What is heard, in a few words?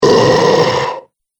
Zombie Aggressive; Zomby Sound Effects; horror sound effects